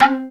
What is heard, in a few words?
TEXTURED PAD; Kid Nepro; Elite